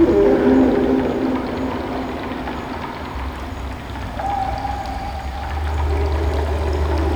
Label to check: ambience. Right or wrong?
Right